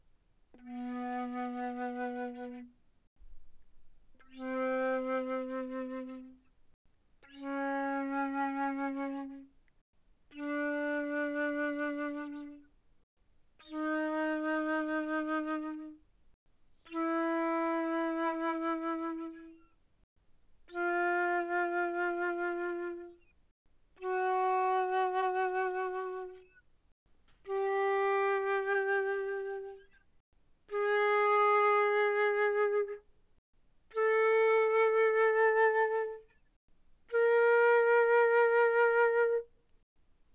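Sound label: Flute vibrato mf B3 B4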